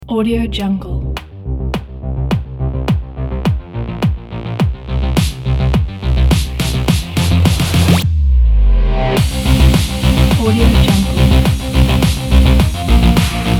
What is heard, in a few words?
agressive sport electro